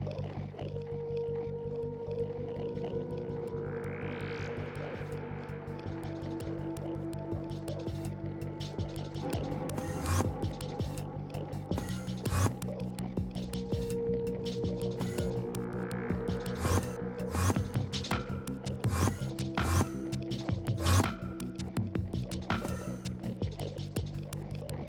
drums fade in; mcsm music; Netflix

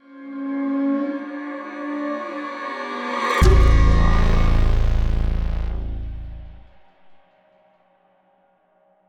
riser Stinger Synth; mcsm music; General Stings

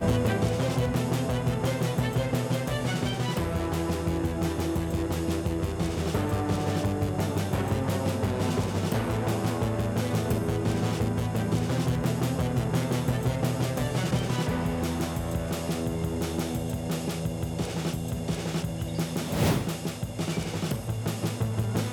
end to drums + fade from drums; BoomtownTheme F; mcsm music; Netflix

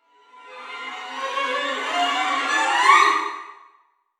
Multi riser; mcsm music; General Stings

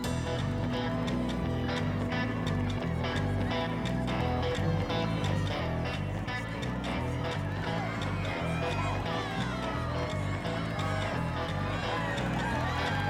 Theme A (fade to drums and bass); Boomtown; mcsm music; Netflix

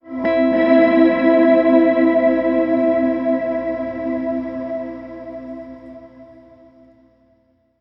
Soft sting E; mcsm music; General Stings

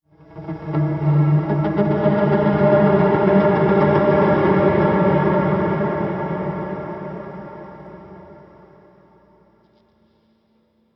Soft sting; mcsm music; General Stings

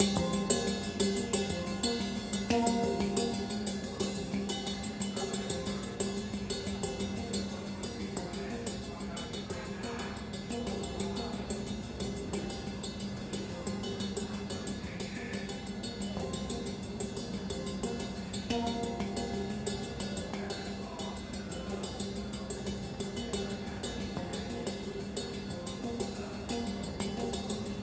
drums and handpan; mcsm music; Netflix; The Order of the Stone

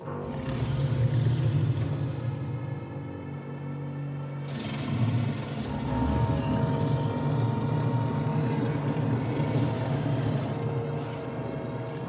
siren fades in, background guitar fades in; mcsm music; Netflix

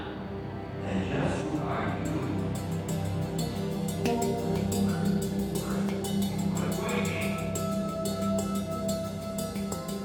drums and handpan; mcsm music; Netflix; The Order of the Stone

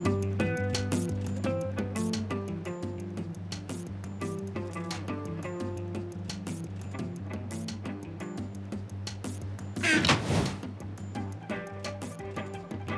Guitar sketch (no melody synth + fade to drums); mcsm music; Netflix

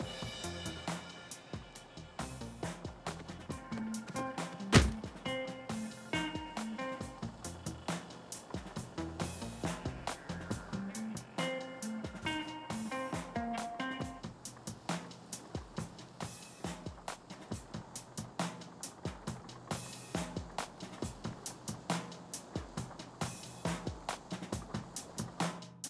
fade to drums; AutoChickenFarm D2; mcsm music; Netflix; The Order of the Stone

